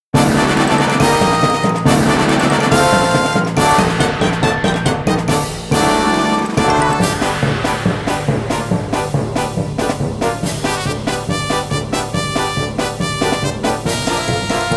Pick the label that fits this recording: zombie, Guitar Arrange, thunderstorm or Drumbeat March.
Drumbeat March